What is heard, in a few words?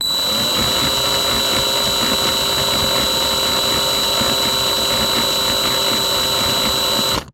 Drill